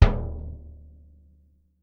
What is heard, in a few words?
BD MF, Percussion; Miroslav Vitous - Percussion & Harp